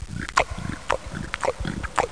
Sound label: Armadillo (engine); Speed Bump